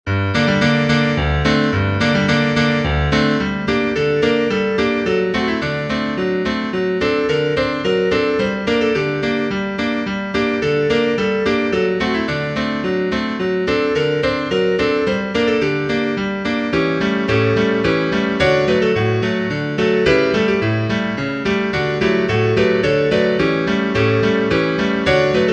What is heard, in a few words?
piano, sheet music